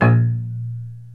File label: PITZ PIANO